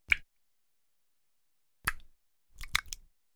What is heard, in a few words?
Eye Popping, Scary Sounds; 1001 Sound Effects; Halloween Horror Sounds Effects